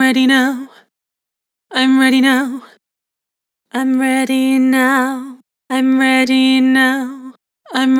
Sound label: Vibrato, Vocals; Harmor Vocals